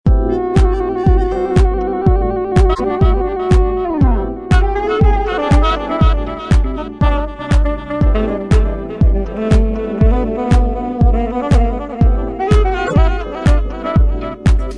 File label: metronome